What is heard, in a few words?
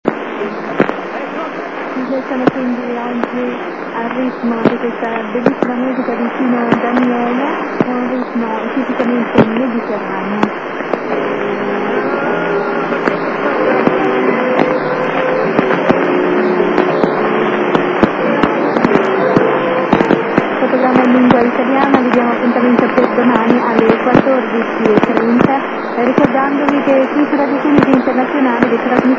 Radio Tunisi 963kHz